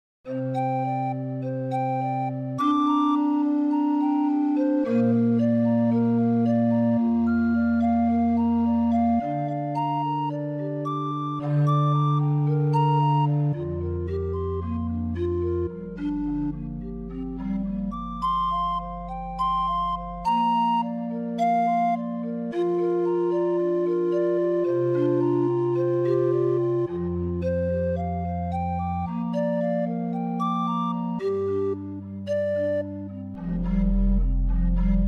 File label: forest pan flutes